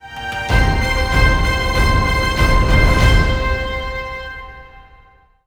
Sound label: level Up